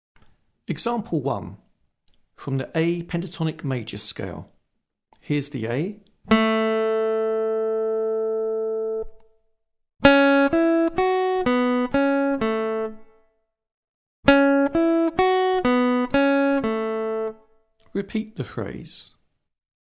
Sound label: Aural Pitch Test, acoustic guitar; Grade 6